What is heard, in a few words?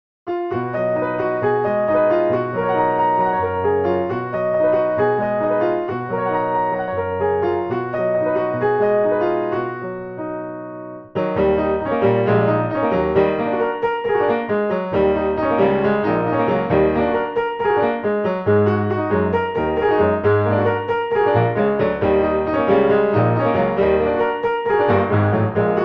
jazz; monk